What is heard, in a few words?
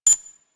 Alt Fire Beep Finish; Weapons; Heavy Shotgun; Corinth Prime; Warframe; Tenno